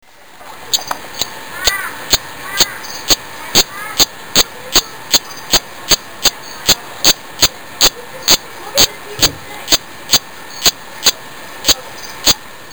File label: D pumilio, chants